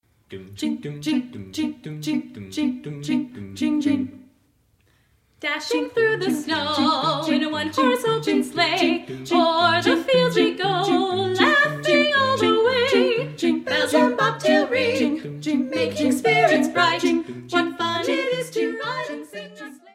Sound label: Fun Jingle Bells